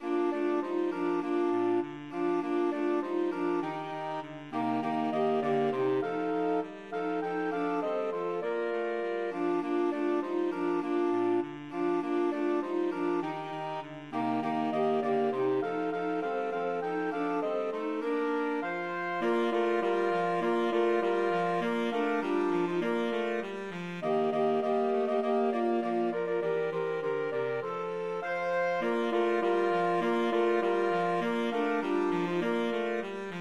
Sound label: jingle bells SAX quart